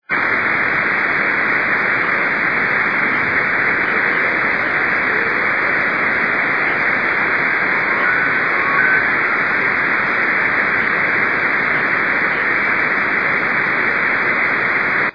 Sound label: 10G jt65c airplane; Beacon